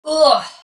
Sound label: human; creature